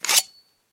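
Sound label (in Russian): короткий металлический звук заточки лезвия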